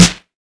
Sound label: snare, electro; Snares